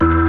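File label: E1 fast power, power B3; Northstar - New Gold VOL-1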